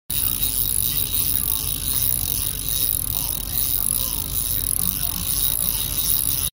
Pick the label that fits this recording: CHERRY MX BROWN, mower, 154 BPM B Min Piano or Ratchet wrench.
Ratchet wrench